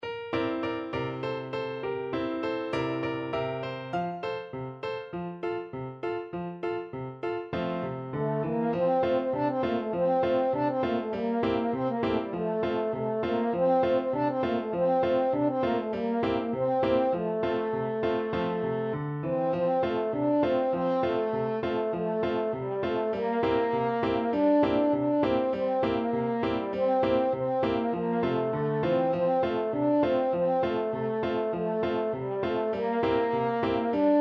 Sciur padrun, french horn

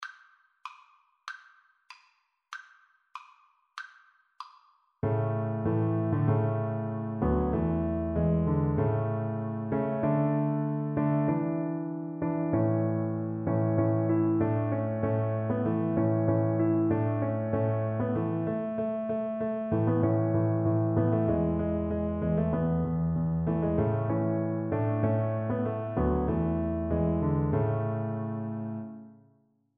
Double Bass

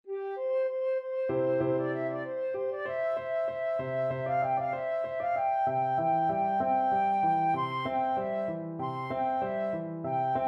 flute

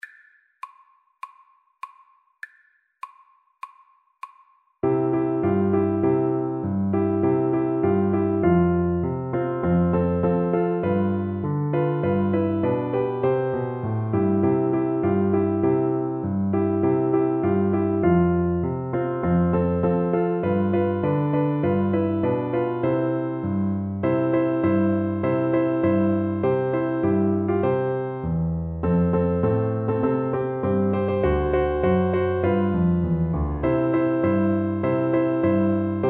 Oboe

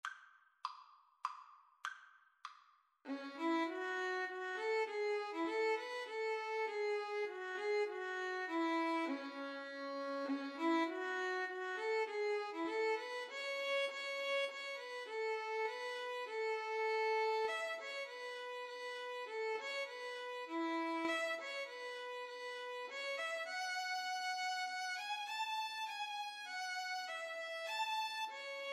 jupiter, violin viola duet; holst

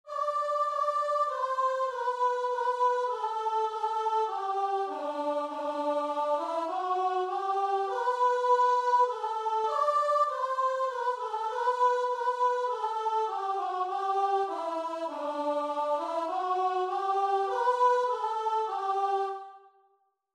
king of love, guitar and vocal

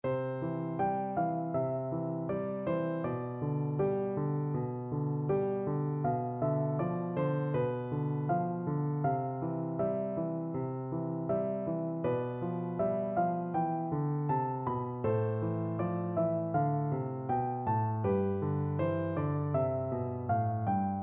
winter, piano duet

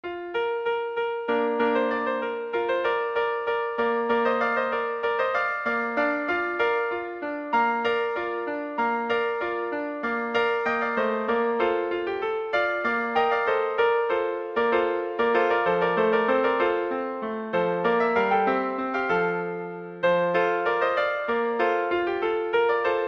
rejouissance, clarinet trio

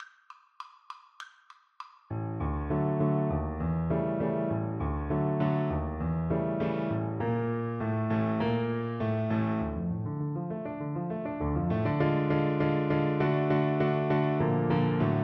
maple leaf rag, Piano Duet

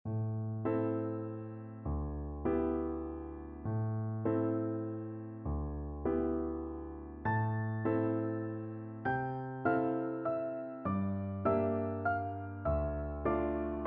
satie gymnopedie3, piano duet

